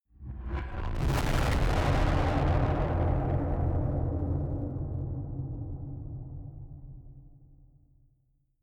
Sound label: distorted blasts